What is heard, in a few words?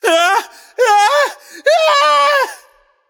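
nonverbal fear; smite; voicepack; merlin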